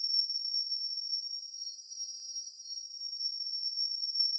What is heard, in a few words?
brakes high ex, Kart